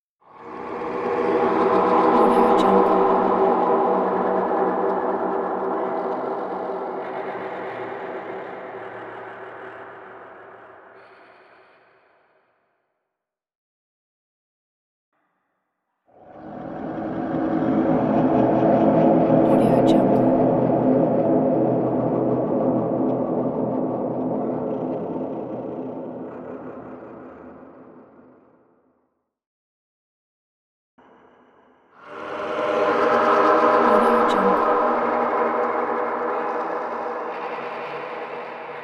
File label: futuristic jungle roar sounds